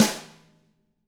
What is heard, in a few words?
DW HIT, SNR; ILIO; Double Platinum Drums 1